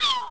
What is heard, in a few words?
seagull; Pirates Of The Caribbean